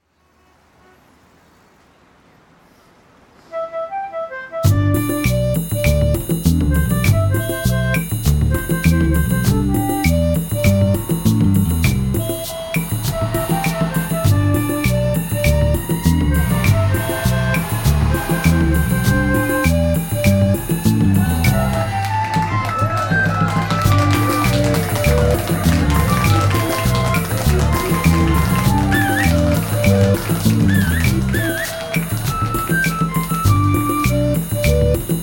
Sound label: Beep Beep; Star Pieces soundtrack